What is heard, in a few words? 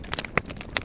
mission bombard fire; night watch